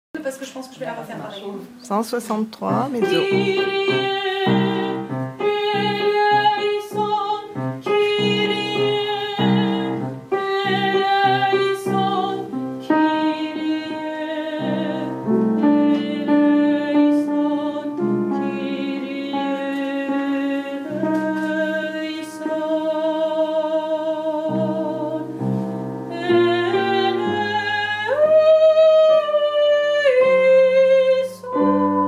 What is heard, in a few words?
kirie mezzo